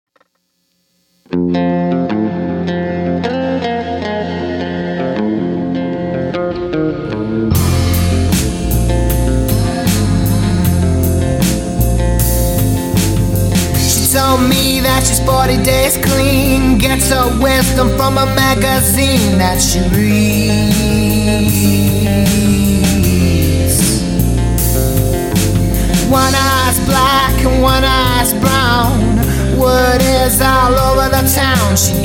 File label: blues